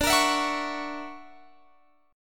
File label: D Augmented Major 9th down Guitar Standard; D+M9 strummed; JGuitar